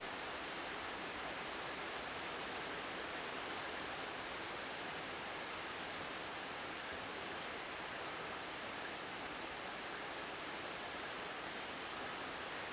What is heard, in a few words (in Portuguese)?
Chuva em casa de campo a tarde com sapo longe e algumas gotas; Coleção Sonora do Cerrado